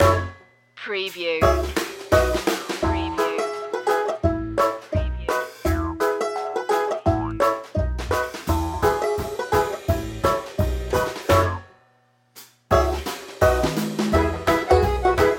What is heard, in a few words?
party barn banjo